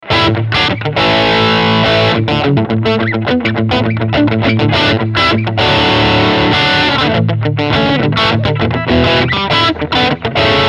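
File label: Overdrivechannel Crunchsound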